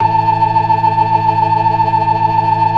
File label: FAST VIB, ORGAN, TREMOLO VIB